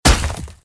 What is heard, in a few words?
sound attack hit; hitsound